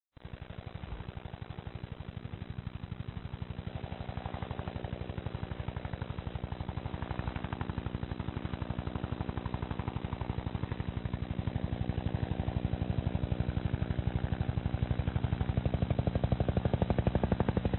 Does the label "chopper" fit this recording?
Yes